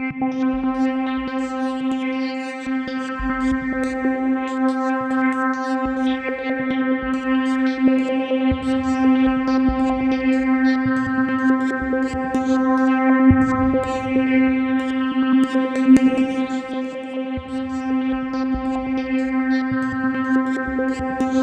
C3, Synth; NiceBeats

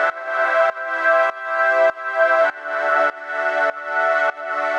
Pad 100 E, sidechained samples 100bpm

